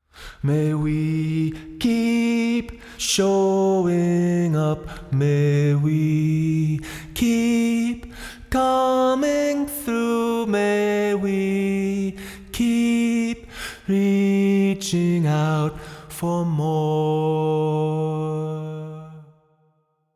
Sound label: vocals plate modern